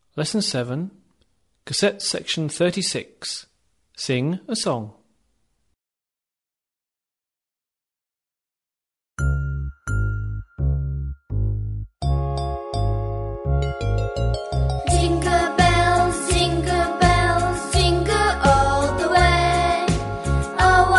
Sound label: song jingle bells; busy bee 3